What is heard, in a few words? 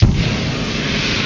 Blow Torch; Worms DC